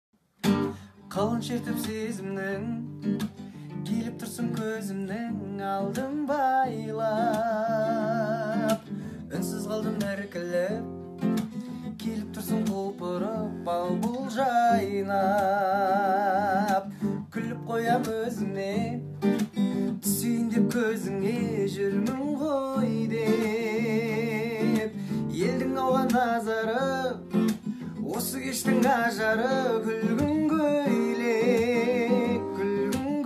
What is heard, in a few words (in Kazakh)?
gitara